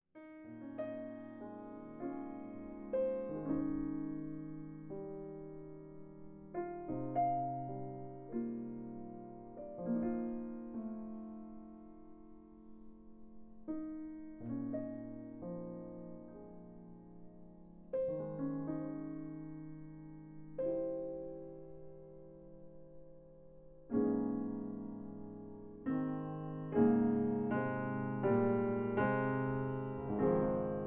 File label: melody at night